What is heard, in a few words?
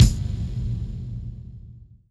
trip hop kick; Kicks